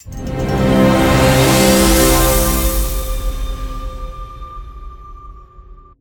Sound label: Transition End